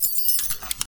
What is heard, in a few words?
ui unlocks sell junk parts; esmteam